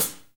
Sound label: HH, Kits, Rev